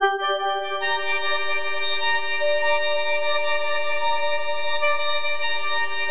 FM G MIN; Bolder Sounds

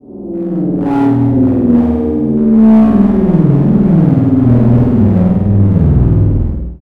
Grinder, Weird; Industrial

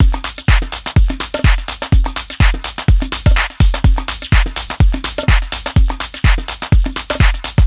Full Loop 125, Loungin House; Equipped Music

